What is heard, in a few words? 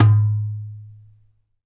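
djembe, African Perc; Spectrasonics; Supreme Beats; African Contemporary; SB Afro DRMs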